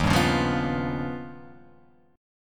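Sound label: E flat Augmented 9th, Guitar, Open F; strummed; 3,2,1,0,2,0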